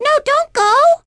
Scroll

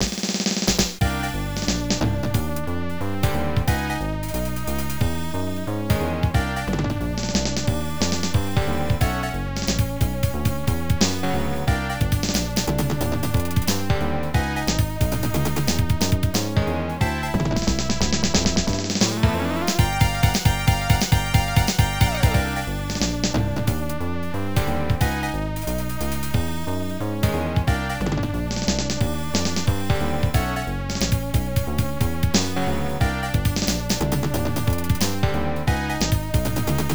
Suspense; Kimi dake ni Ai o; X68000

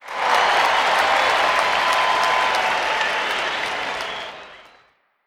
Cheer; Pac-Man Championship Edition 2 Plus

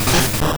Bonemerang

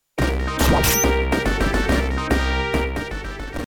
Stage Start; Rocket Knight Adventures 2

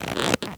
Leather

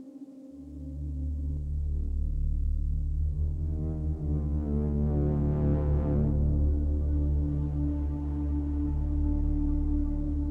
drone

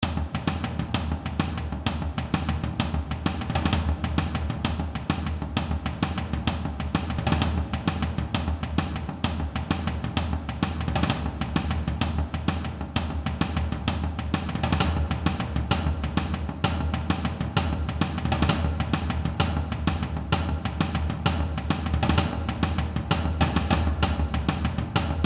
Longbow Drums; The Lab; valve

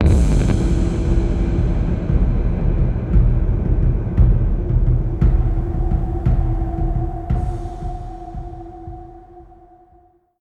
Ambient Startle

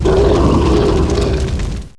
spawn boss Burning Dead

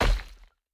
Moving Obstacle Stop Debris; Boomerang Fu

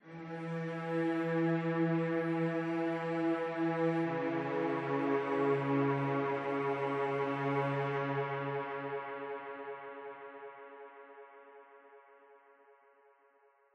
Diving Music Cello

